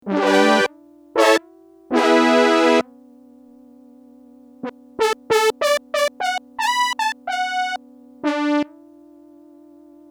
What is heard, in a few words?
GR-700 Failure